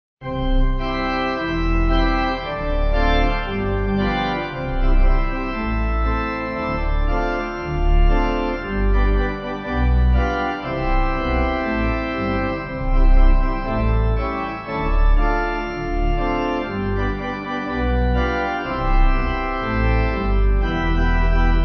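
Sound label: Pipe Organ, Music Backing Files for Churches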